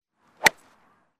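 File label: calendar alert, Golf World; Nokia; 6270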